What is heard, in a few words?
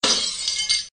window